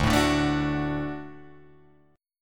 D Major D down Guitar Drop D

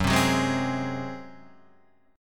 Gb Suspended 2nd Flat 5th, Guitar, Standard; strummed; 2,3,4,1,1,4

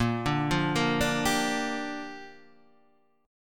A sharp 6th, guitar chord; arpeggiated; JGuitar

